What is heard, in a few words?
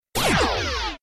Laser Pulse